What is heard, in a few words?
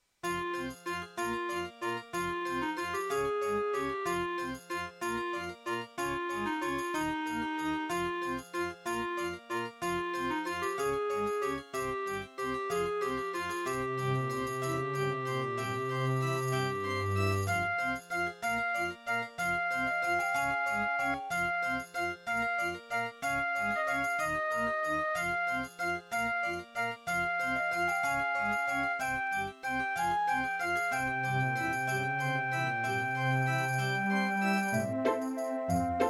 Labway LWHA111800 (AdMos QS700); Death Gate - Track 6